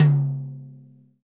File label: TOM TOM, Real